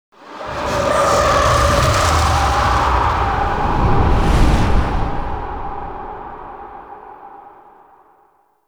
Intro Cin SFX; Warframe; Tau; Cinematics; IntroCinematic

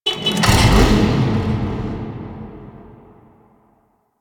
Tailor Shop Motorcycle Customize; Interior; Warframe; Nineteen Ninety Nine

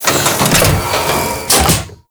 Biped Pod Spawner Open; Warframe; Corpus

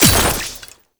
Ammo Cast Start; Warframe; Frumentarius

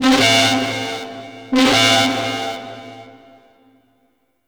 Spawn Alarm; Warframe; Corpus; Ambulas

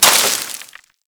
Melee Impact Dirt

